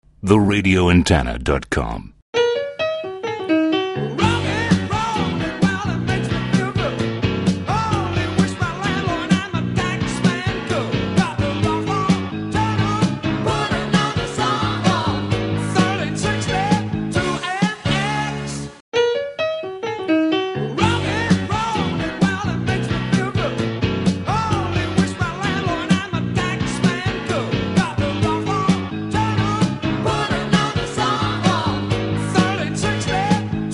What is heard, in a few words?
Jingles Rock On